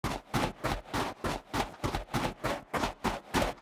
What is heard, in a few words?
snow run